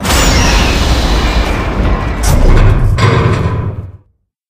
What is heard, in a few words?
chamber door open partial